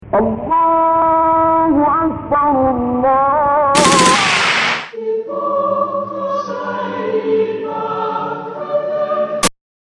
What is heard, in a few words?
Danish ring tone